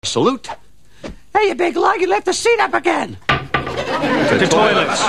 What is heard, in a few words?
toilets